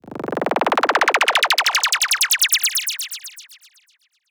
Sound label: Knock Knock; Electro Dub